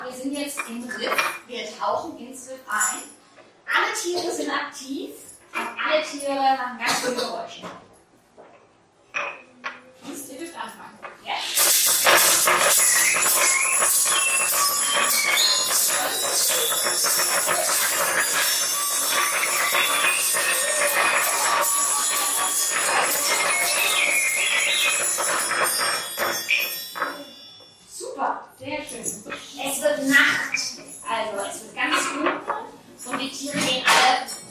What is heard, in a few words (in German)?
Fischgeraeusche